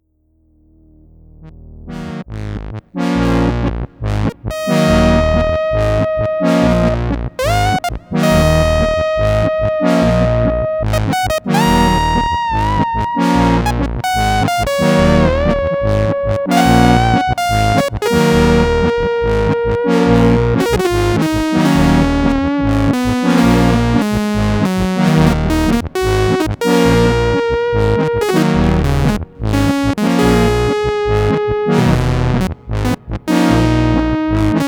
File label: MemoryMoog Short Solo